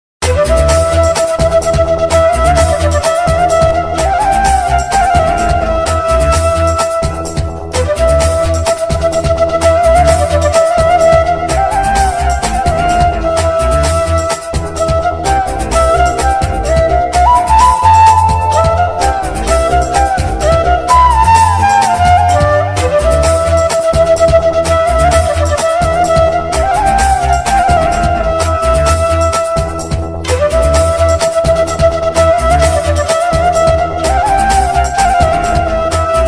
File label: flute bgm ringtone love bgm ringtone south indian bgm ringtone